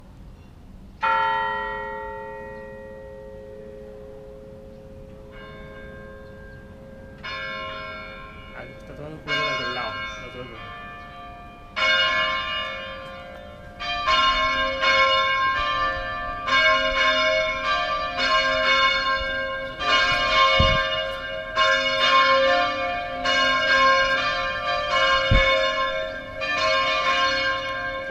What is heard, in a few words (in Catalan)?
campana